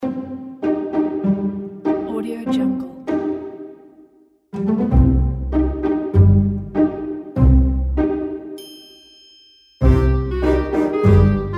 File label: awkward comedy funny